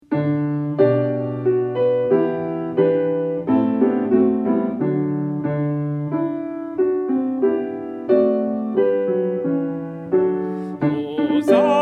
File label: Benedictus Sopran; Weihnachtschorfreizeit; Übeaufnahmen